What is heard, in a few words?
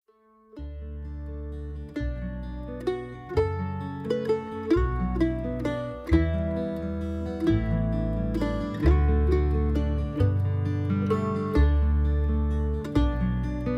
Redeemed, Mandolin Hymns